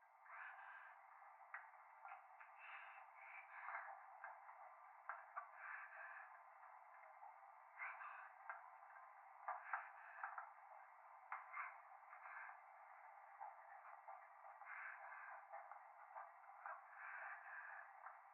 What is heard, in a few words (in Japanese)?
風呂 左耳密着処女喘ぎ 電車